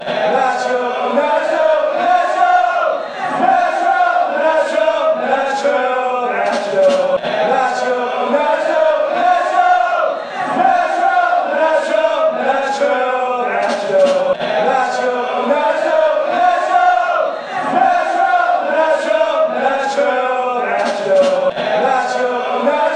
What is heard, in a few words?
chants; nashville